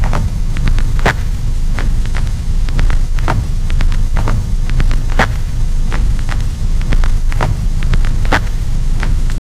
huge monster foot step in

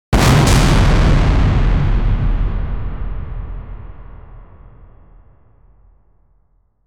total annihilation mystic, mystical

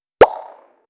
cartoon snap gunshot shar; 1930s

